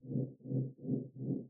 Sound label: PASSIVE AMB STACK